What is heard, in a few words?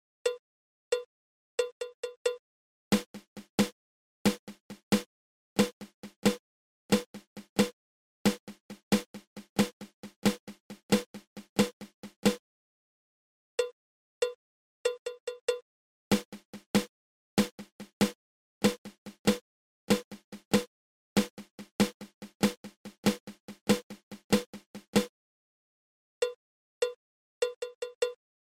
Flam Accent